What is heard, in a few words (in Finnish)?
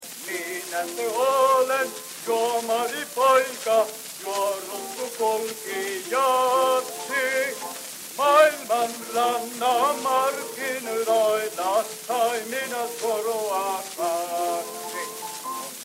kohinaportti